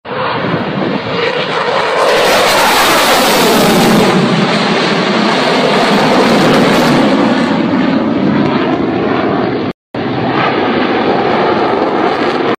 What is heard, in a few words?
Rafale; جنگنده; رافال